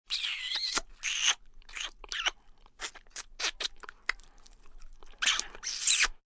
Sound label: Kissing, lots of small kisses (human voice); Kiss MultiSmacks